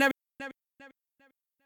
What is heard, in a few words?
voice